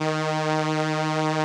SOLINA, String Machines

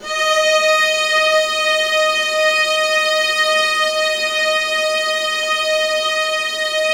D#3, Ultimate String Collection, VLAS EXP; LEG

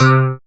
synth, c, Top End Tinklers

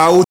HUM OW; AMG - Now CD-ROM (Roland); Art of Samplng

